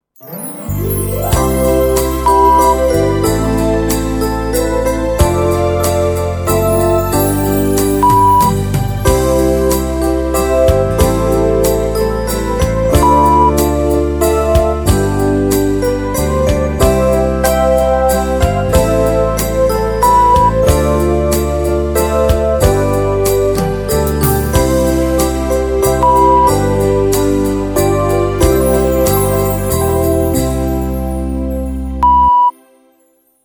Xmas Walzer A 93bpm; unbeschwert

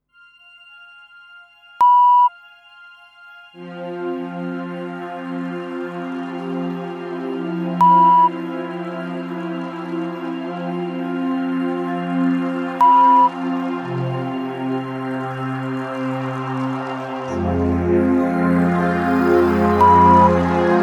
Meditation Rain Dreams 70bpm; Meditative, entspannende Hintergrundmusik / Meditationsmusik

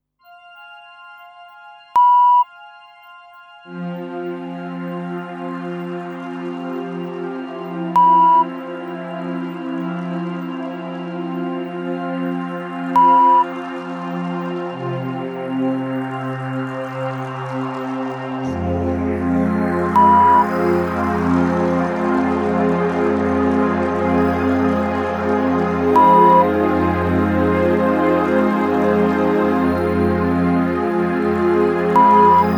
Meditation Rain Dreams 70bpm; Meditative, entspannende Hintergrundmusik / Meditationsmusik

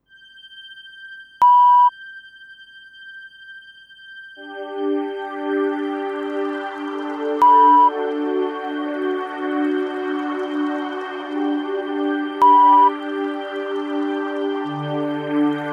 Meditation Rain Dreams A 70bpm; Meditative, entspannende Hintergrundmusik / Meditationsmusik

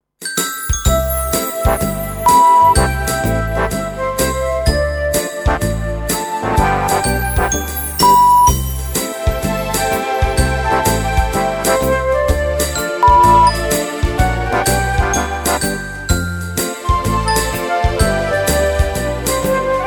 XMas Slow Swing D 126; unbeschwert

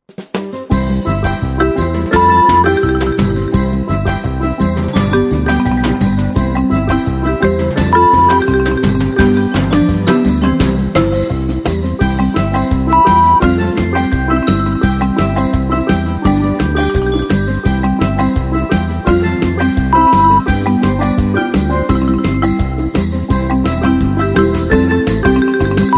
XMas Latin Gogo 170

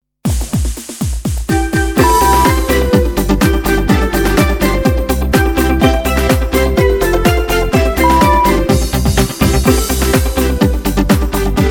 Happy Birthday Dance A 125bpm

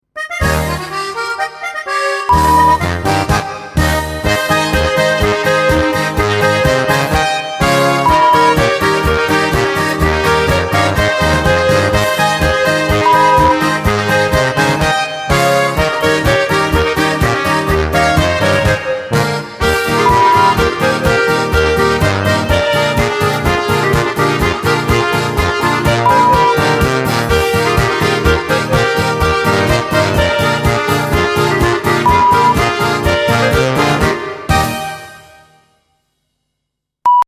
Oberkrainer Polka 125; Volksmusik, alpenländische, bayerische Musik, Zillertal-Sound, Oberkrainer; Zither

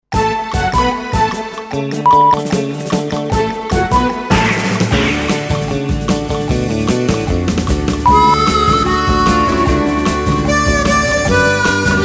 151bpm

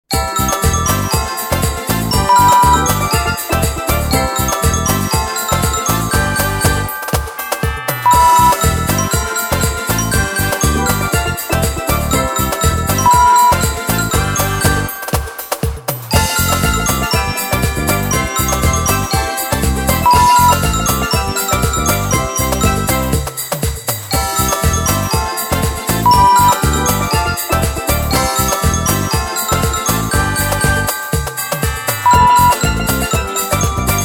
XMas Calypso A 120

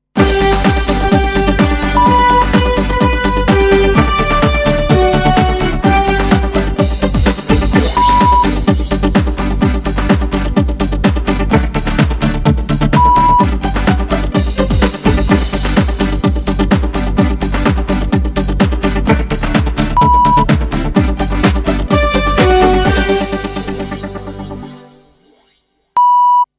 Dance A 127bpm; Hoch soll er leben

